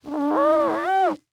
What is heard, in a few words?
Household Glass Cleaning With Paper Towel And Glass Cleaner Squeaking Single